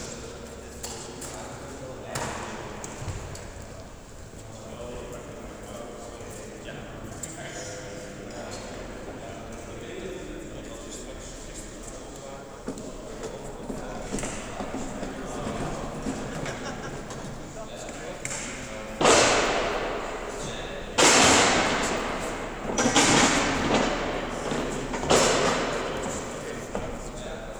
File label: foley